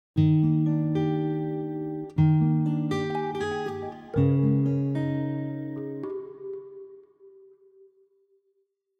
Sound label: town bgm ambient head 120bpm; chicory a colorful tale; ps4